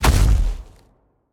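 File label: movement footstep; smite; god cthulhu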